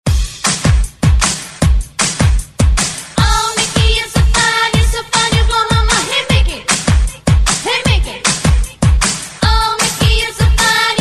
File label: smooth & groove